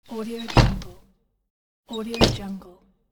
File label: wood door close; soberman